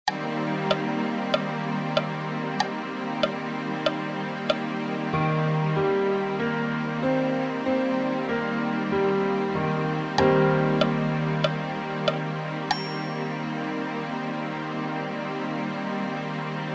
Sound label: min7 inv